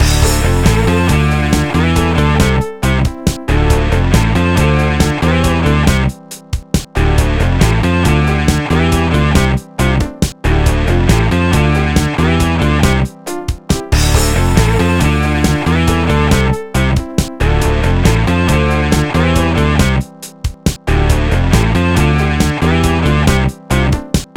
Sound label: Menu Loop; Guitar Rock Tour; DSiWare; NDS